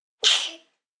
Sneeze Blow, Sneeze, 1 baby, infant; human voice, kid, child; Sneezing; VoiceBox

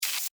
Insect, Hiss, Attack, Spray, Bug; Animals:Insects; Insect Hissing; Blastwave

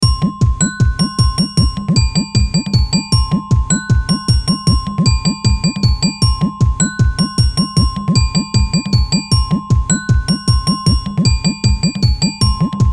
Beat Quirky Tabla Bells Melody; Miscellaneous Loops

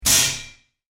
Sword Impact; fantasy; Sword Sound Effects; combat; role playing games, magic videogames; Andrew Fleming

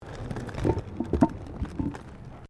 ROLLERBLADING MANHOLE COVER

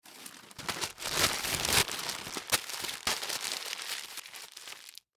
Newspaper Crumple; Materials; Blastwave; Foley

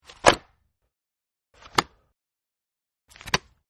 Book Cover Close-3 Takes; Book Closes; Offices

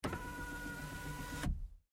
GMC Savana, Power Window, Up, Truck; Vehicles; Big Room Sound

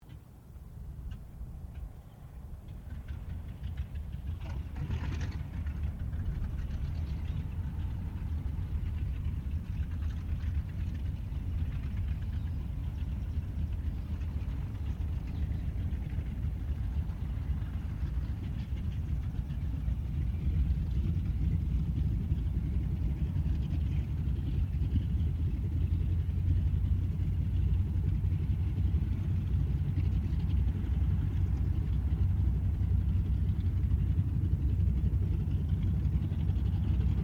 Ceres Winjeel Wirraway startup distant; Mixed aircraft squadrons; Airplanes; Vehicles

